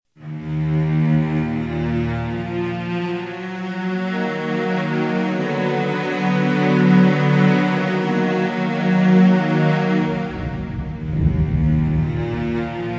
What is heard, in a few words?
cello